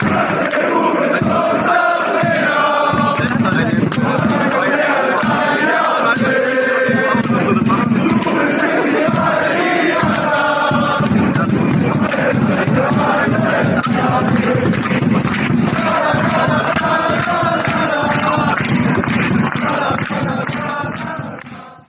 fan chants; frank schmidt unser trainer

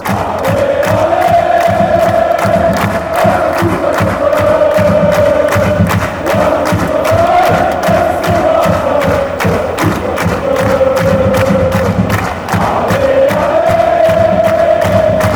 allez, fan chants

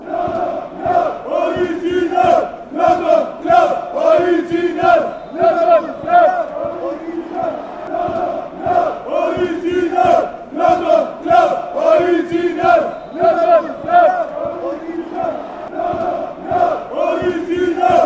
aek athens chant

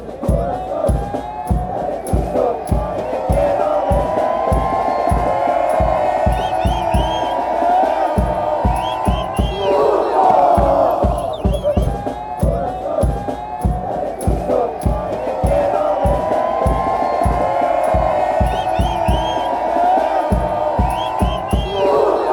ehhh, fan chants; tuzos

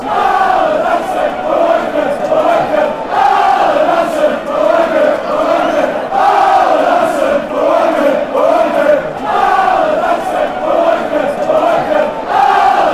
fan chants

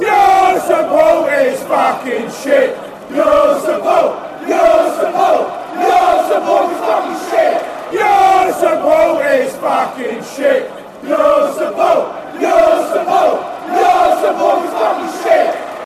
your support millwall fanchants

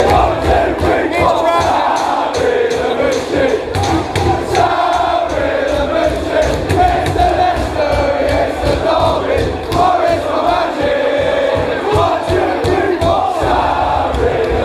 chant, fanchants; sabri lamouchi

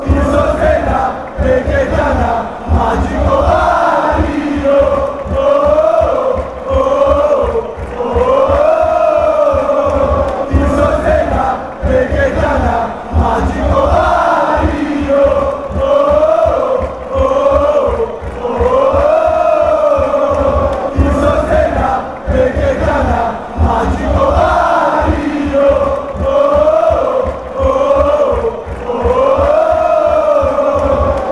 magic, chants; bari

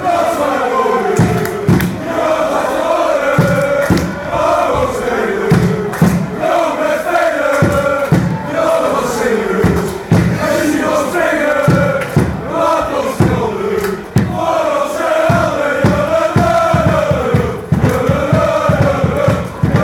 heerenveen chant

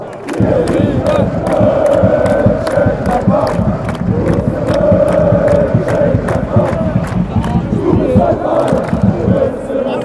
chant; b moenchengladbach

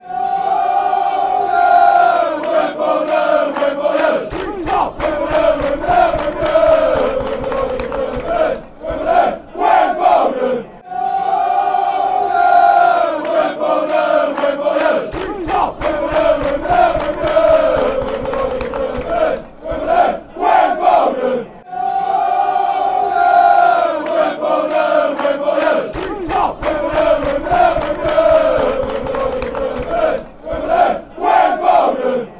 wiiimbledon, fan chants

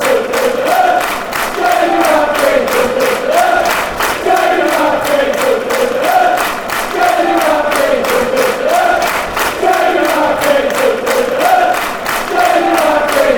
fan chants; jami mackie

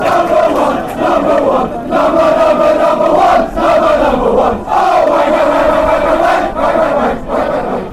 fan chants; get goalie no1

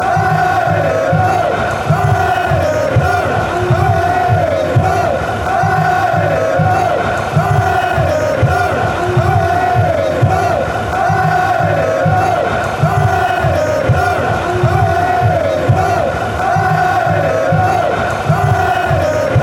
allez les blues, fan chants

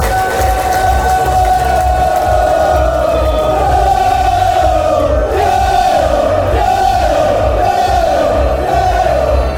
daaallle, chants

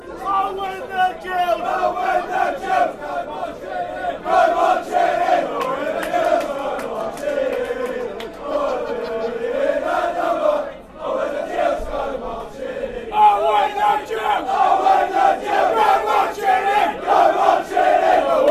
when the gills go marching in, fan chants

